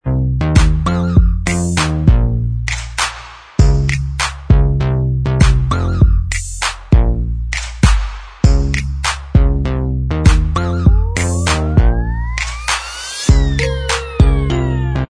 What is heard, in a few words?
Inst